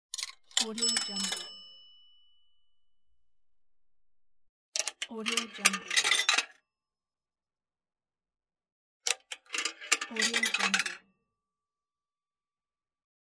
throwing a coin into a pay phone; ambienceworld

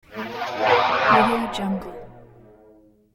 ghost by low pitch

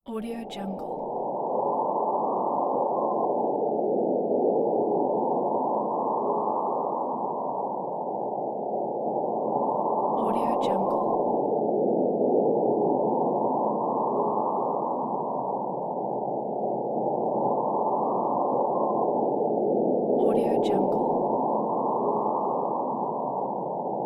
cosmic wind

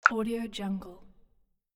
button

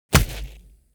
impact body fall sand thud

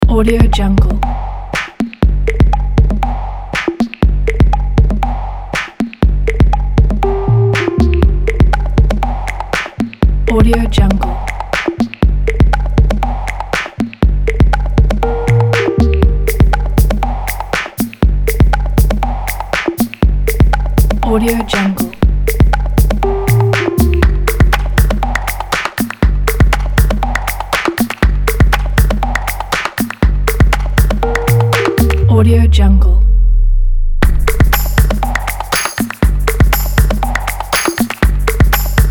modern fashion rhythm

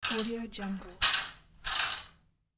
sliding curtain

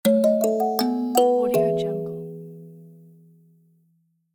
musical kalimba childrens transition

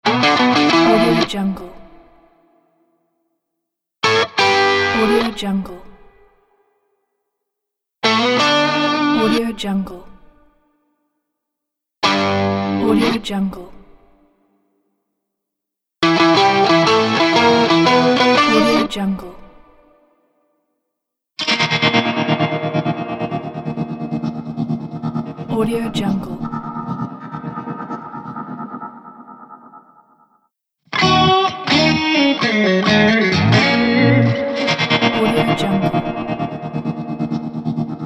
country logo pack; chasecreatness

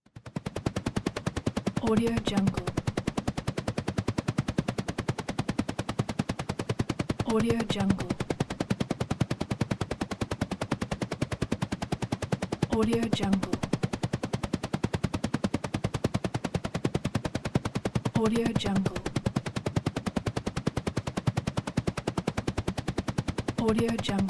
propeller

